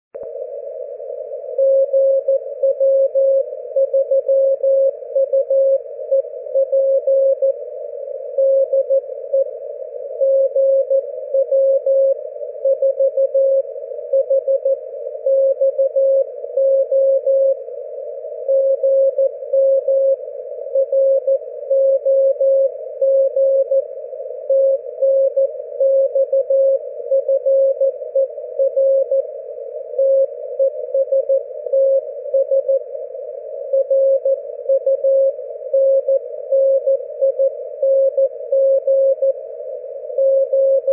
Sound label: 40mW RF